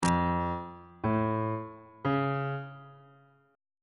d harmonic minor chord 3 is f augmented on bass clef